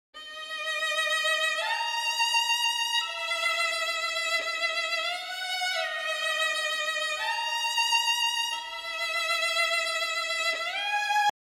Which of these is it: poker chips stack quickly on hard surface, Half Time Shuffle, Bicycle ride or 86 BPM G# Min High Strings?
86 BPM G# Min High Strings